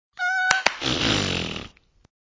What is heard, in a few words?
clap lights offs